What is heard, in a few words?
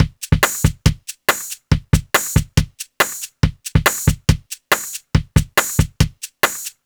Tech 140, loops; funkyxtreamsII; BoxTech